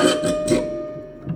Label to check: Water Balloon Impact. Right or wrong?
Wrong